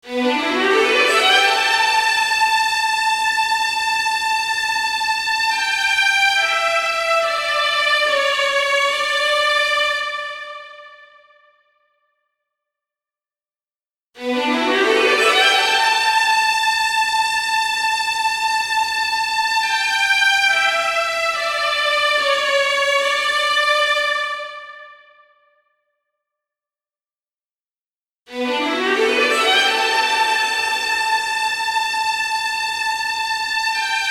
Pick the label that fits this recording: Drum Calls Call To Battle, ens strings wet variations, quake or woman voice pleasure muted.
ens strings wet variations